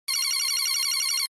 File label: Interface Calculation Error Synth Glitch Retro; lesser vibes